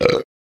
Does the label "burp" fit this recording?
Yes